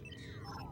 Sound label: Computer Responds; FED; Trek